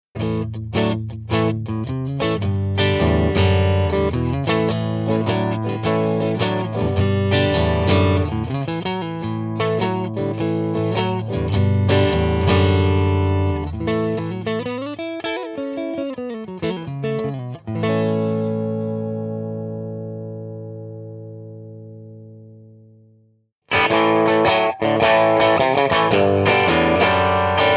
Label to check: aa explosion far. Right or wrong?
Wrong